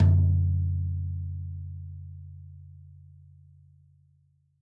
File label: deep tom sound f key, Royality free tom drum tuned to the F note. Loudest frequency: 116Hz; tom drum samples; one shots